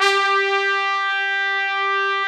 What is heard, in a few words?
TRUMP; AKAI S-Series CD-ROM Sound Library VOL-2; TP+SAX1